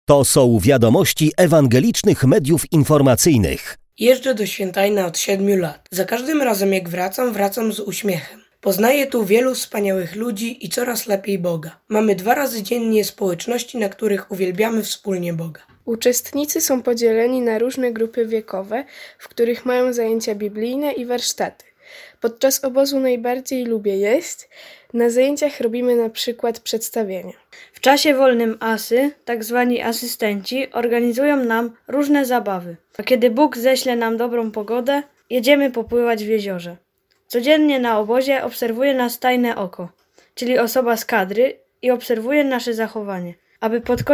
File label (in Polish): Dzieci cały dźwięk, obóz chrześcijański dla dzieci; w swietajnie